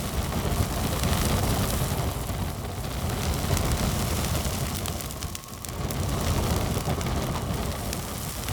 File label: Ammo Crate Destroyed Fire Loop; Objects